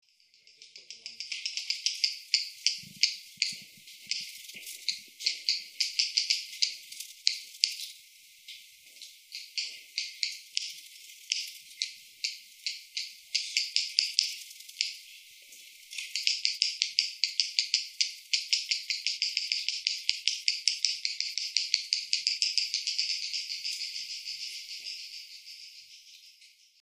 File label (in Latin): aerodramus sawtelli, ptaki; salangana towarzyska